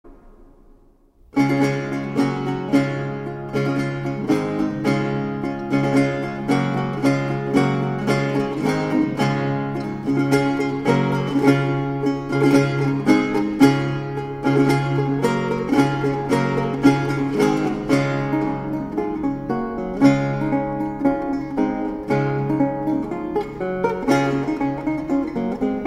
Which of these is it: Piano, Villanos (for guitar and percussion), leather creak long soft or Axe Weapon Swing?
Villanos (for guitar and percussion)